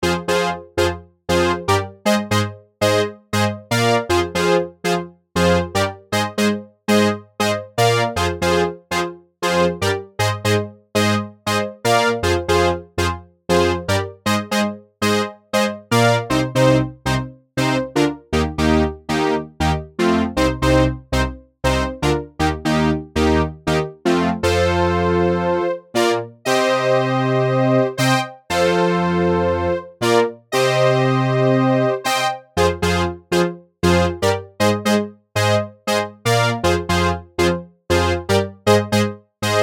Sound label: Anthemic Brass 2 wet